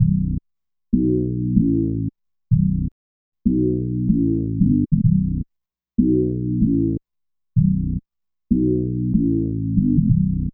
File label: BASS LP